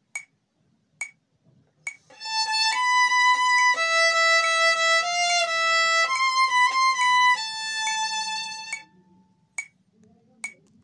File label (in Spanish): Extracto B Lento con metronomo, cumbia